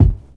original kick drum single shot F sharp key, Royality free kick one shot tuned to the F# note